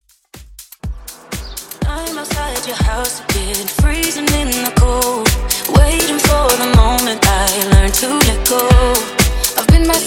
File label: Pop Dance ReDrum, 122 BPM